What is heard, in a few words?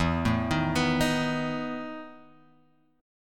E Major 7th Suspended 4th E, Guitar, Open G; chord shape